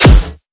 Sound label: Swiftly Hit